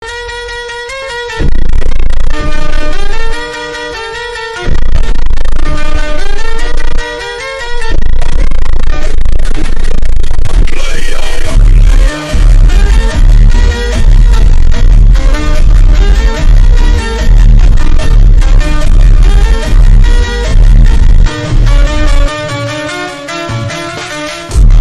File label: FUNK superbass reverb slow